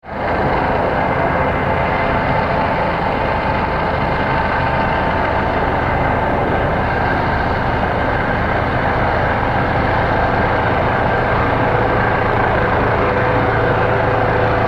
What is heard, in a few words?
Large Helicopter hovering overhead; Helicopters; Vehicles; Helicopter Ambiance